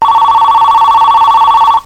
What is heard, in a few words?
Melody, RingTune